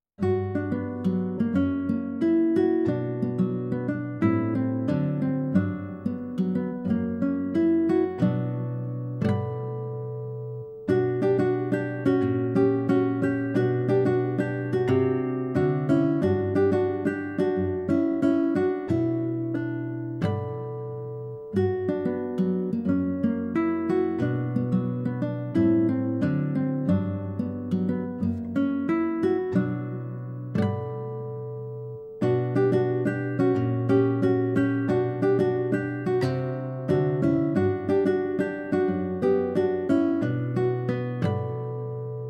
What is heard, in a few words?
guitar solo, classical guitar solo; beethoven ludwig van; desir